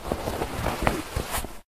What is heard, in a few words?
Fabric